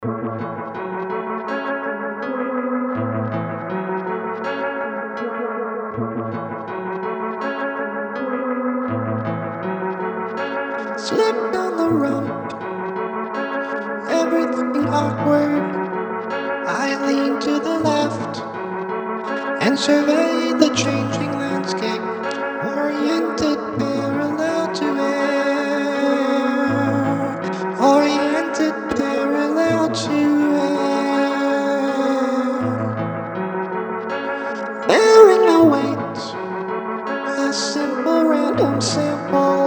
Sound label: Birchbark Horn; With A Birchbark Horn